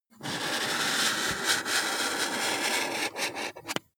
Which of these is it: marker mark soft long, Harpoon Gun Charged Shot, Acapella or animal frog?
marker mark soft long